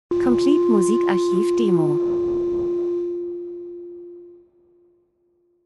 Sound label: transition; Transition & Movement - schwebend Delay spannend